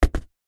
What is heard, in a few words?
fruit melon set down drop ground; zapsplat